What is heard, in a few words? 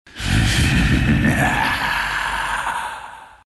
laugh; ancient apparition